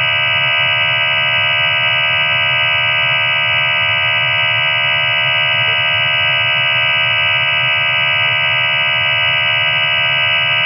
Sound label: prc 4 4 traffic, psk